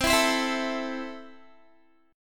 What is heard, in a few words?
C 6th, Guitar; strummed